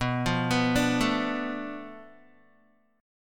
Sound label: B Minor 7th Flat 5th B, Guitar; arpeggiated; 4E,4A,5D,5G,5C,6F; 7,8,9,7,9,x